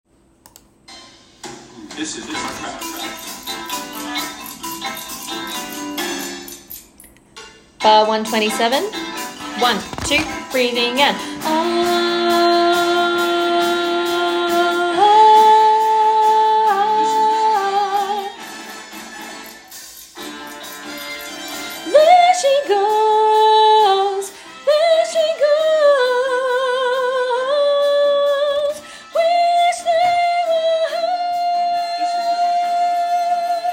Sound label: Soprano; Fame The Musical - Holiday Pack